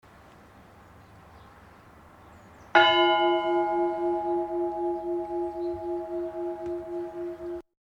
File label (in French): cloche; Moissat Bas